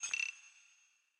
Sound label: movement run; smite; vamana